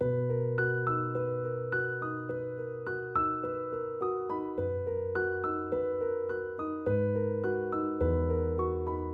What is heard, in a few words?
Bmaj 105BPM Piano; 99Sounds; Monosounds; Music Loops; PANDORA BOX!; Warm Night